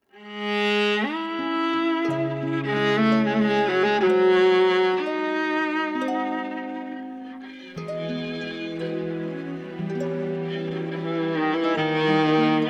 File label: instrumental, persian